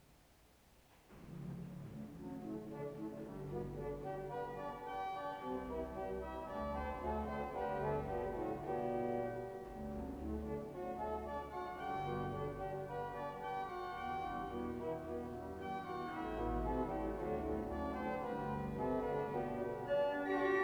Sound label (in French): improvisation musicale